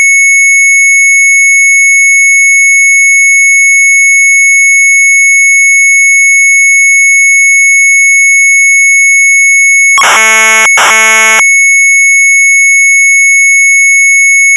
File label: Sharp MZ800 Tape; DIAMANTZOEKER; retromelsarchive